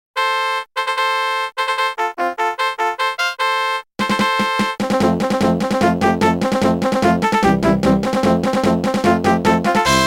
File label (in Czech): melodie